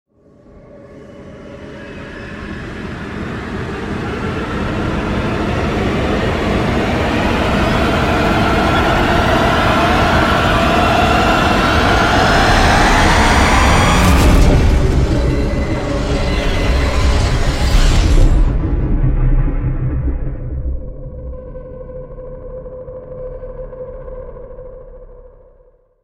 incoming projectile

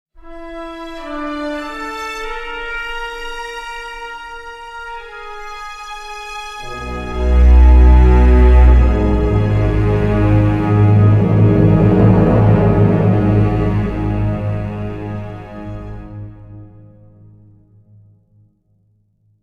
magical trailers transition; sound adventures

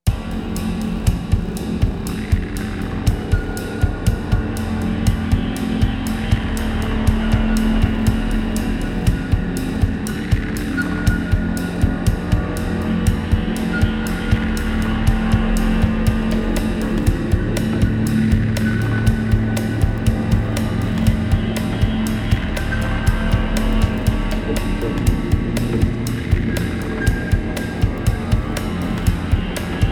dub

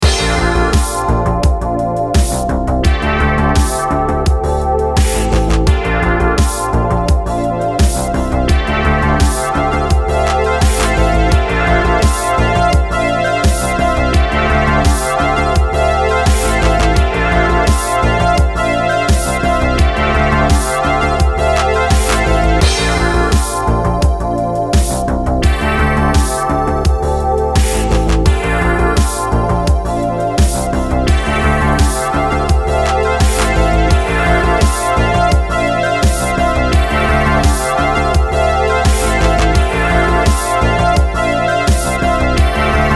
80 s love synthwave mix

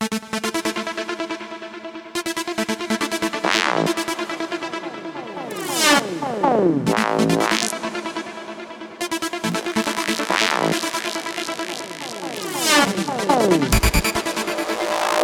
top trance running hits

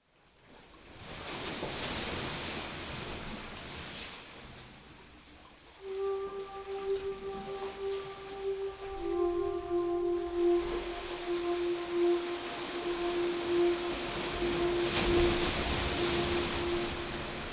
crashing waves background

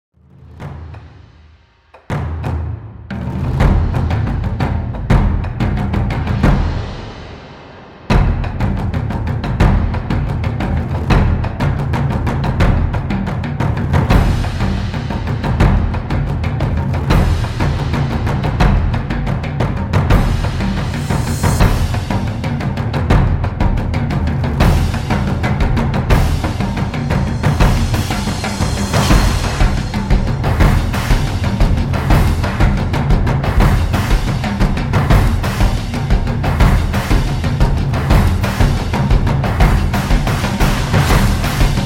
drums

